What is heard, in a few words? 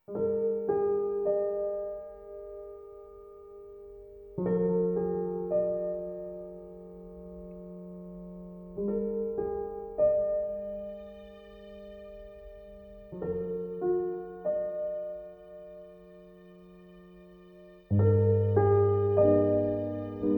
Piano string; colonne sonore